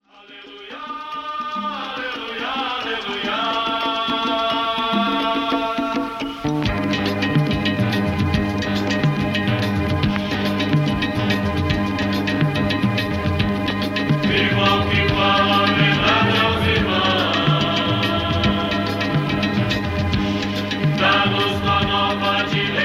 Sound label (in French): musique